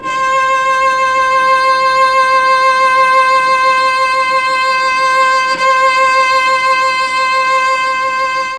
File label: C4 CELLO, Mellotron; CHMBLN CELLO; Mike Pinder - Mellotron Archive CD